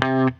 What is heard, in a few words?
HEY, Roots Disco; USB Soundscan vol.32; AKAI